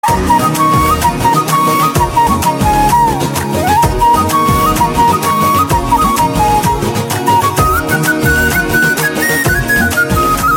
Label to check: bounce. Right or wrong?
Wrong